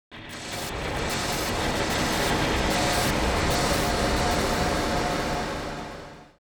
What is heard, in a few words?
hollar